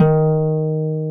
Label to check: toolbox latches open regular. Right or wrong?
Wrong